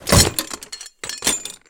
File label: steampunk mechanical mechanism metal; bluezone; sonniss gdc